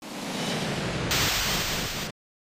Battle Intro

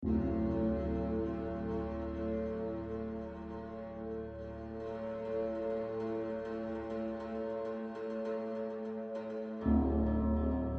Tension Block; The Last of Us

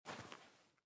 step sand; out of reach treasure royale 2020 gamerip pc

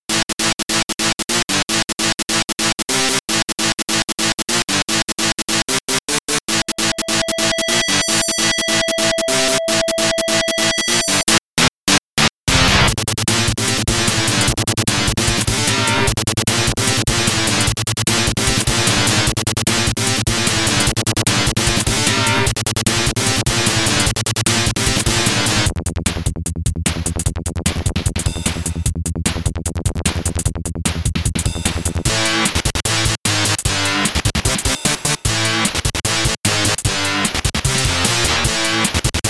Heavy Sounds; don't call me amy; pc-98

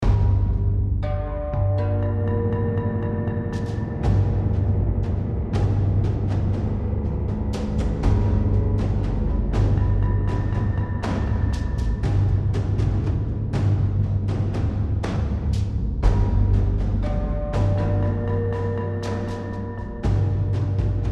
Tension Combat Alternate

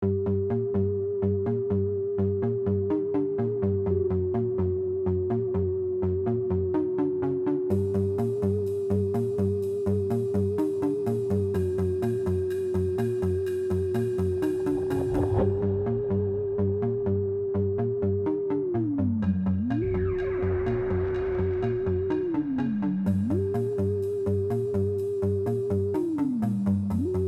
Day Battle Prelude; Zombie Rollerz; Pinball Heroes

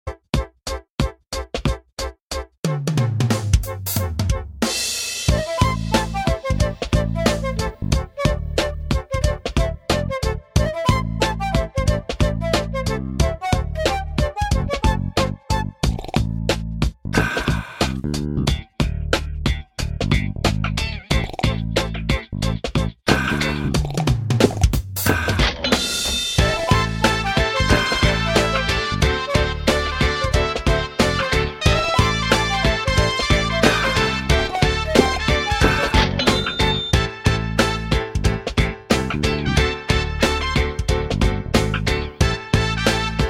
music bold; coffee shop gamerip

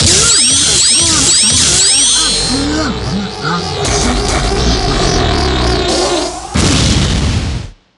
Boss Dead Yeti; Super Monkey Ball Banana Blitz HD

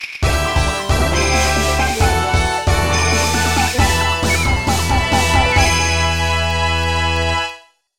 Battle Win; Pokemon Conquest; nintendo ds

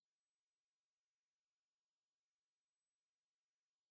Light At The End Sine

